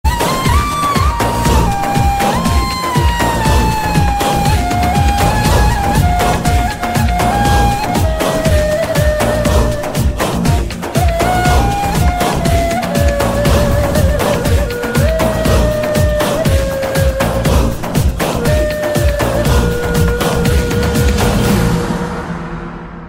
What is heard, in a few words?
Ney zikir